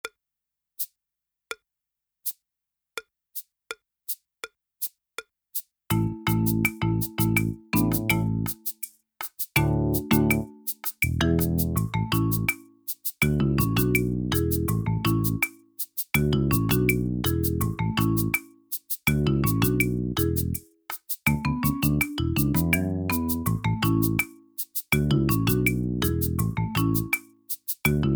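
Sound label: backing, drumming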